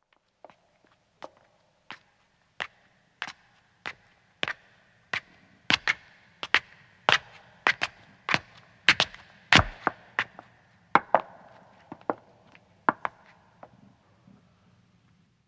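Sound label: Footstep stone ascending, 2 women approach and stop; Part of Footsteps (Sound Effects:Human); BBC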